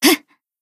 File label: Battle Shout; Kazusa